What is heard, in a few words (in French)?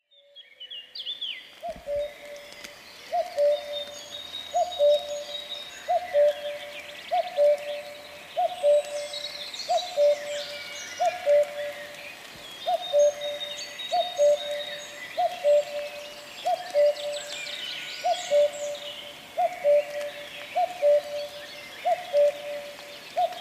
coucou; exposition nature habitee